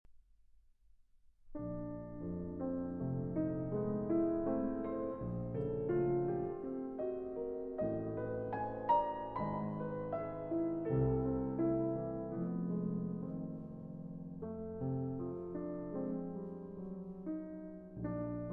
Piano Sonata in B flat major, Andante dolce, Allegro moderato